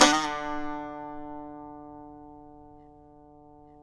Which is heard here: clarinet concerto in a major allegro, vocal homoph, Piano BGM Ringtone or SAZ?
SAZ